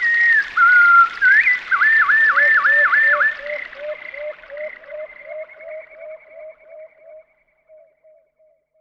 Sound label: loop; X-Static Goldmine 3